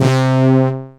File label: Analog Brass; instrumente